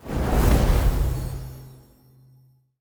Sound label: Fire Spell, Magic Sounds; Fantasy Sounds Bundle